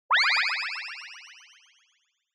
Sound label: Motion Pop